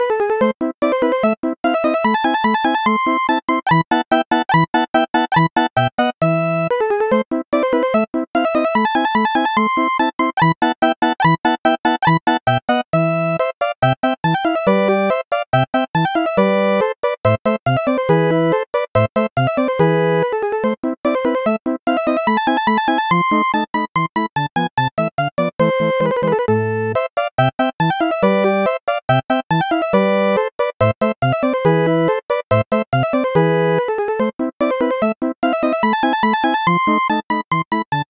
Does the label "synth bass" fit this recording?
Yes